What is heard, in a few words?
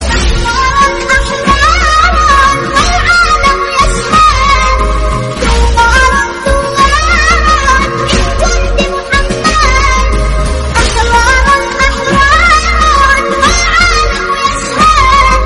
nasheed, arabic